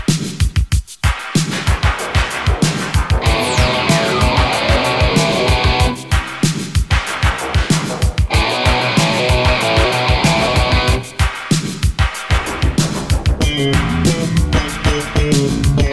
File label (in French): accords